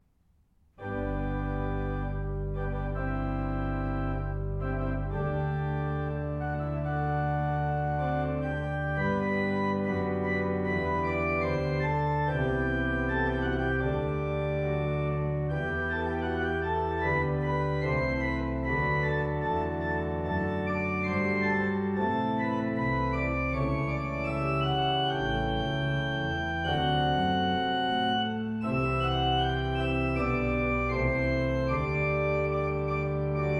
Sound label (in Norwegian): brudemarsj, buvik kirke; overhalla; skaun; brudemarsjer